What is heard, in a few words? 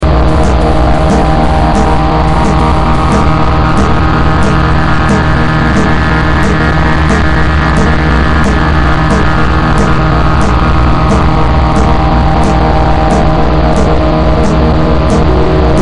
astral drumz